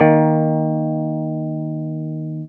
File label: guitar 5th, e-guitars